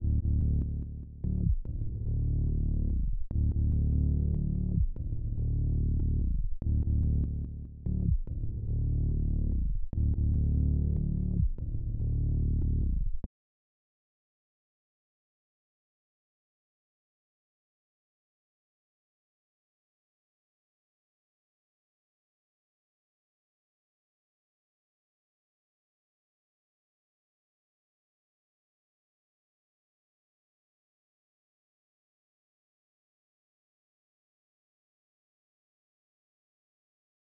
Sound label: 145 BPM D Min Bass, melody loop stem; Trap; Loop Stems; Cymatics; 2022 Melody Collection - Trap Pt 1; Bloodline